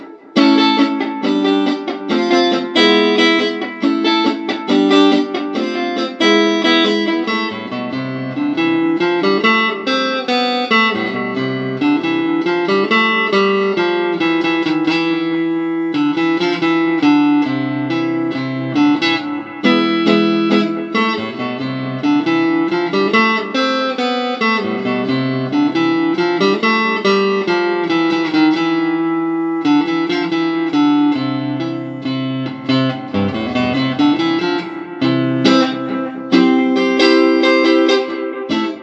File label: clean